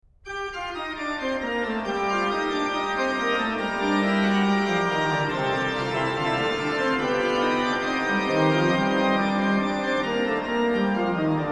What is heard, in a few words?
Wir Christenleut, organ; BACH; BACH ORGAN WORKS; Chorale Preludes